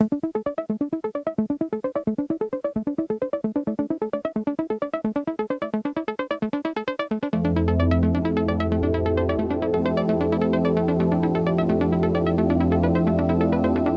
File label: Electronic Impulse